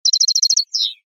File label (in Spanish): tititichio, campero